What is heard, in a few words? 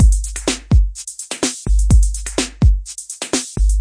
126 bpm shuffle techno hop, drum loops and breaks